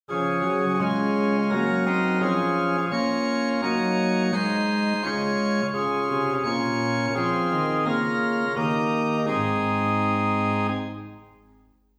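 Gedackt 8 + Rohrflöte 4 + Nassat 3 + Octava 2, organ; Silbermann middle-sized church organ; St. Anne; Hin: Gedacktes 8', Rohr Fleute 4', Nassat 2-2/3', Octava 2'; Reinhardtsgrimma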